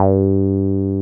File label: 303 OW G3M, 303 OW BS; Drums In Your FACE!